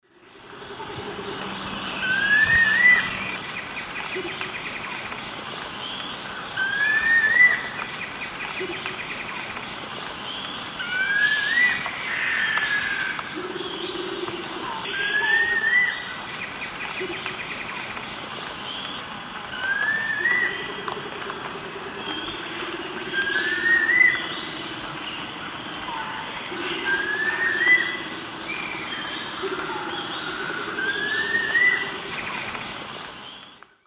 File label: Ramphotrigon ruficauda, dawn song; S. America; Eastern Lowlands